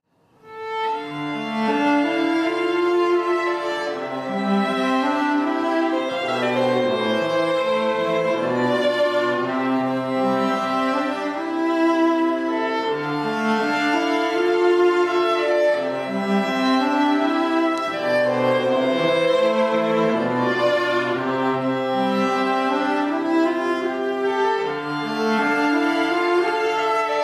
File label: ensemble; classical musicians